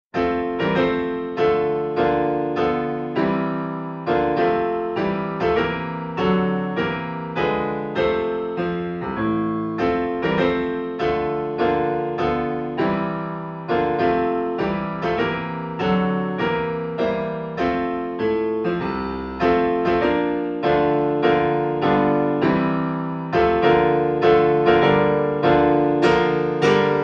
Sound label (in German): Bechstein Hymne Klavier